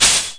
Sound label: dx100 Pink noise C4, Yamaha DX100; drum machines